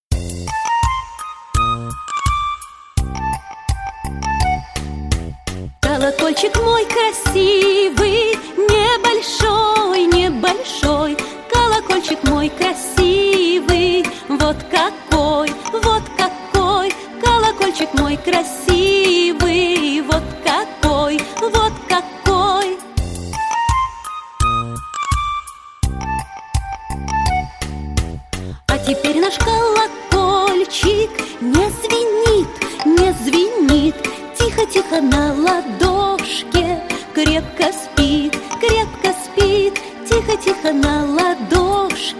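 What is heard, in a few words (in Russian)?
пляска с колокольчиками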